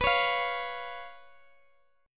B Suspended 2nd Flat 5th B Guitar Half Step Down, chord